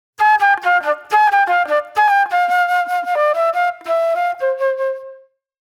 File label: Reflective Flute; Sonic Foundry (Sony Creative Software); Manhattan Flutes